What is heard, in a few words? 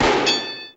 gun richochet; Crash Bang Boom